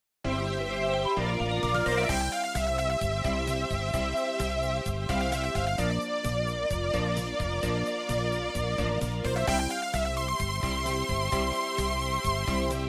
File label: MIDI Field